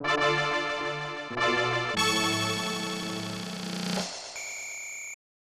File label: PK Start